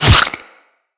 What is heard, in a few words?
Bullet Flesh; Impacts